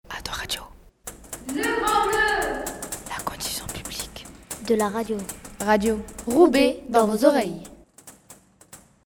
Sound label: Jingle; ADORADIO